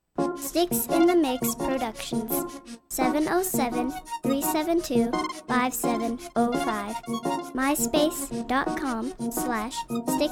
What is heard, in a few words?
bells